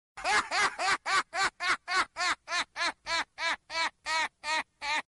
laugh

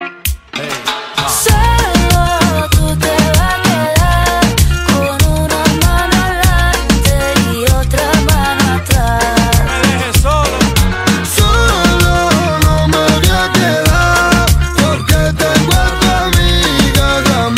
solo

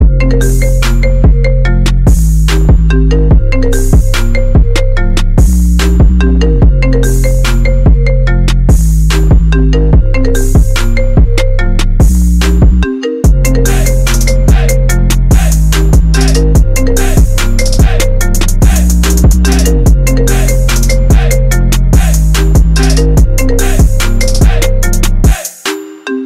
iphone trap remix

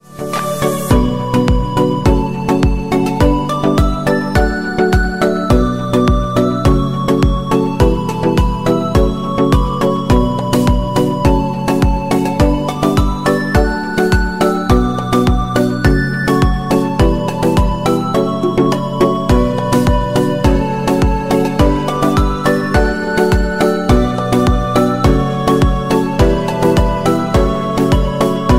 sony xperia 1 vi

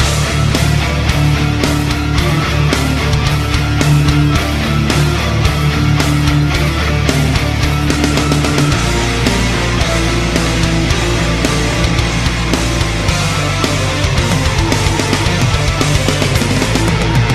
heavy metal

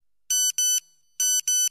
nokia 1100 standard

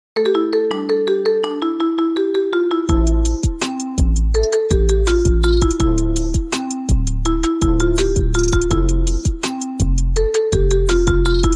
easy marimba

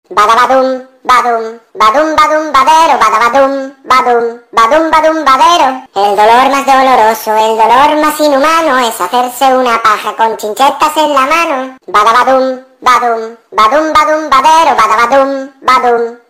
badabadum badum badumbadero

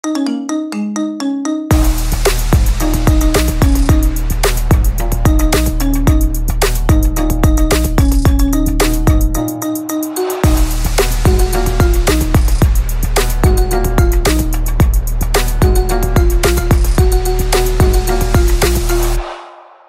resonance marimba

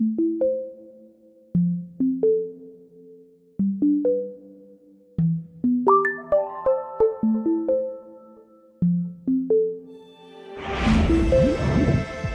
android 10

